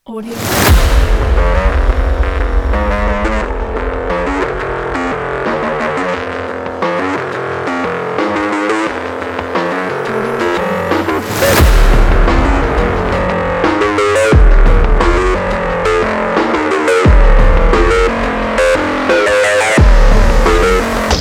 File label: sport big beat